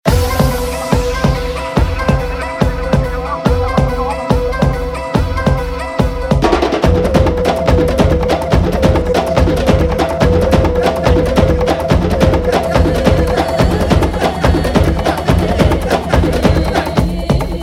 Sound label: Drum Beats Ringtone